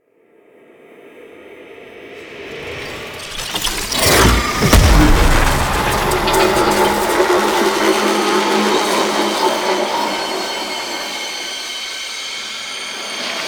Push; Natah; New War Stage Two; Warframe; The New War; Cinematics

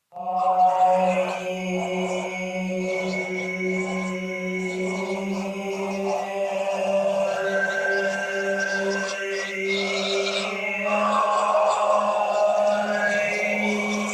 Choir; Warframe; Sentients

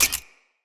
Security Camera Motor Stop

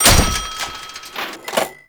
Cash Register Destroy; Warframe; Ambience; NineteenNinetyNine

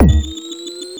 Alt Proj Pre Explosion; Crp BE Plinx; Blastor Pistol

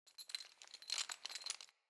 Tent Ring Jingle, Interior; Soul Plane

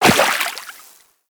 Operator Walk Water, Footsteps; Warframe

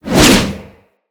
Hammer Whoosh; Weapons

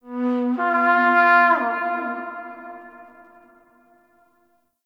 Battle Trumpet Horn Call; Warframe; Tau

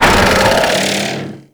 Thumper Pain Groan; Grineer Thumper Bot; Warframe

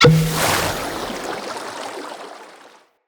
Root Cave Cork Burst; Ambience; Props; Neathuns Root Cave

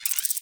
Scope Gun Zoom In, Weapons; Warframe; Corpus

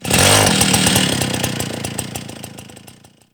Ghoul Sawman Rev Ambient; Warframe; Grineer Ghouls

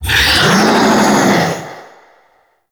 Vocal Attack; Wraith Reaper

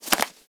Foley Idle Ear Flap; Enemies; Warframe; Catbrow

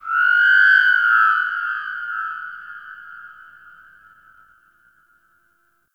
Sentient Texture Medium, Ambience; Sentient Lair

